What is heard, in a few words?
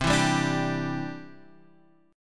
D 7th Suspended 2nd Suspended 4th C